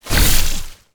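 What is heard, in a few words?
plant creature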